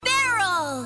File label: Barrel